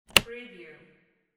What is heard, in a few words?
briefcase lock